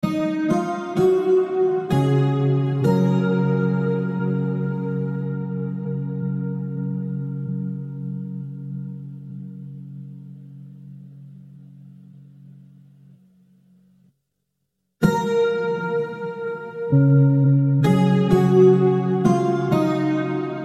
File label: relax lovely beats, cinematic pads